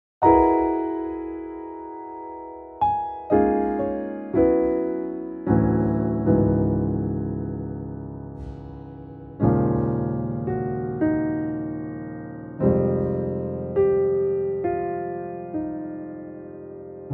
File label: Prelude